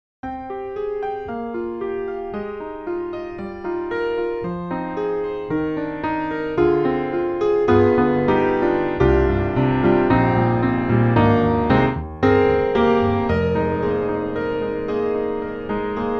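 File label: piano; Soniccouture; The Hammersmith Professional Edition; Rack Focus 3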